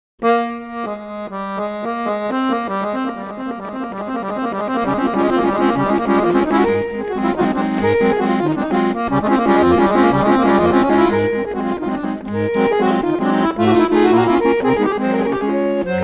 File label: Waltz, accordion